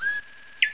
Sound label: CHIRP; animal; 鸟